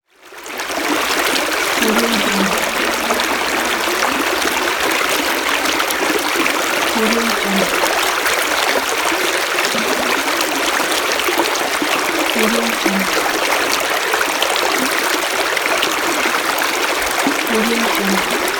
water; rowneypeters

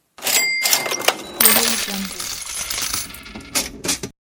money; sound-create

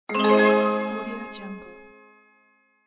surprise; medusamusiclab

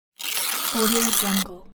paper rip

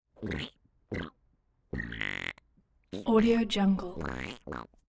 vocal quacks comic duck squawks farty

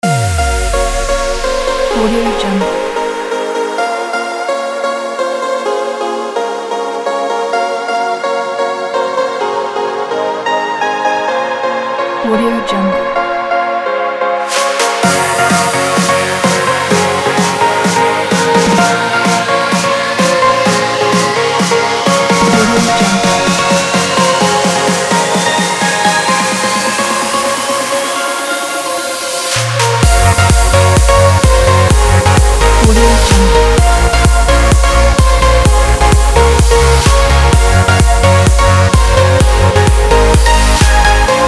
dark progressive house

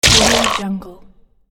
blood splash big

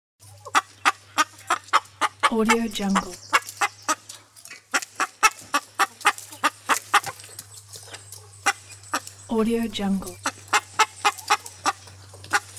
clucking chickens

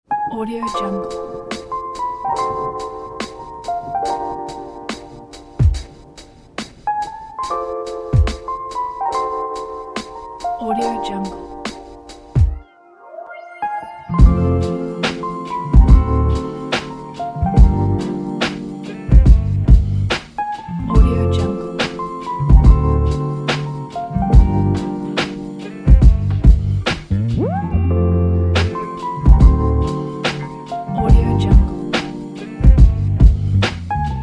lofi jazzhop

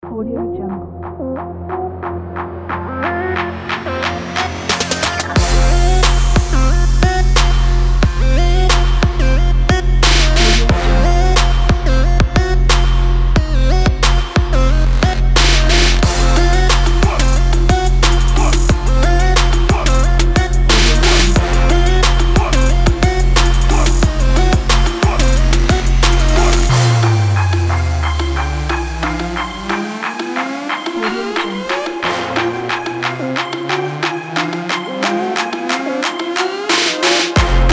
a trap

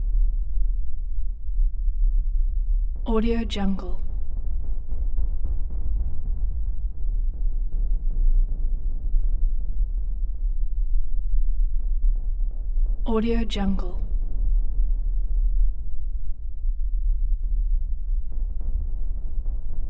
nightmare helicopters

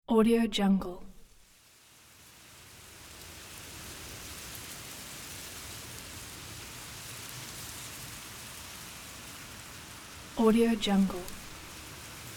soft wind in grass

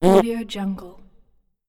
fart

